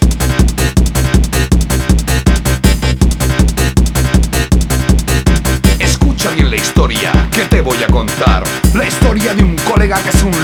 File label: Techno Ska; Z-I-v Summer Contest 2021